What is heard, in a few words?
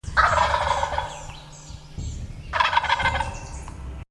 Gobble